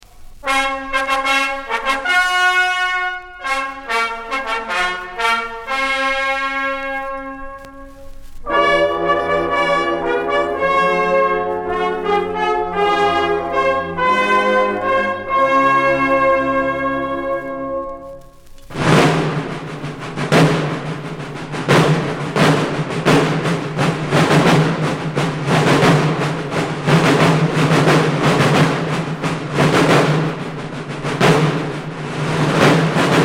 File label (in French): Le Champ d'honneur, Les Tambours, batteries historiques